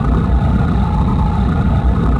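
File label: vehicle steady loop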